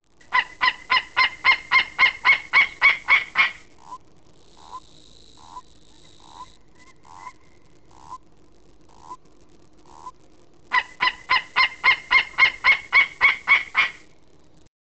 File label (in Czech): zvuk, ptaci